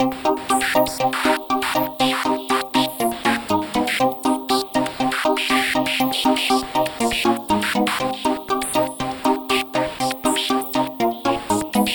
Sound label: Electro Circle Groove